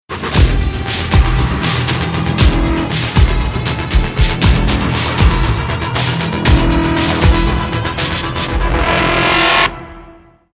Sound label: bomb ten sec count; Counter-Strike Global Offensive